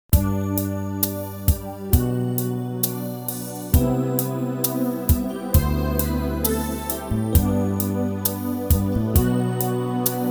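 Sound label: G live track; female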